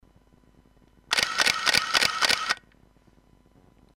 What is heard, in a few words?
X-1 MOTOR 5 times, camera